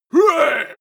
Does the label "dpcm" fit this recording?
No